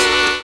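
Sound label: SAX N GUITAR; Univers Sons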